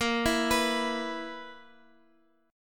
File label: B Major 7th Suspended 4th A sharp